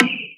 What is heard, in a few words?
Percussions